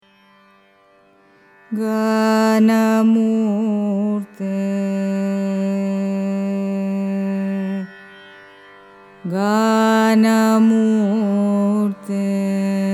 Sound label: raga ganamoorthi carnatic